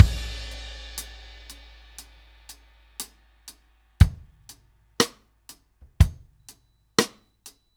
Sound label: Ballad Loop, Drum, 70s Ballad; Drum Tools